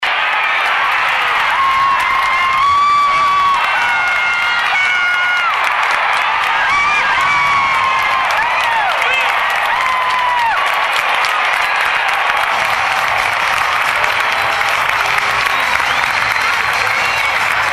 Big Crowd Excited

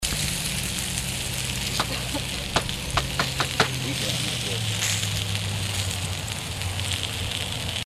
Sizzling Hotdogs

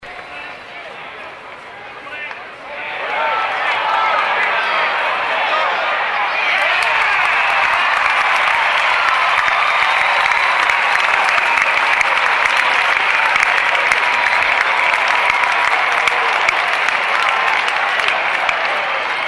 Flyball Caught

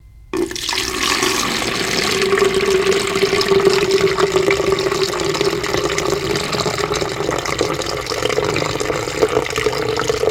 Pouring

